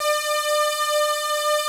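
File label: synth brass D5, Prophet 5; Greytsounds VOL-1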